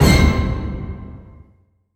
Orchestral Punch; Sonic Foundry (Sony Creative Software); Dr. Fink's Funk Factory; Orchestral Hits

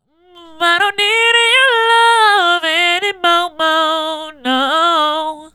Female Solo, Vocal

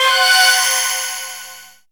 Ambient Atmos, Dance Synths

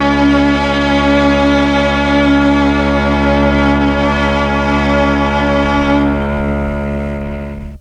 MEL STRING; ILIO; Propeller Island Legendary M400

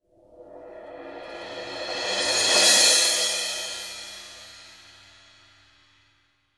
MALLETS ON, crash; Sampleheads; New York City Drumworks VOL-1; SP CRASH

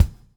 BUBL, BUBBLE; Spectrasonics - Backbeat

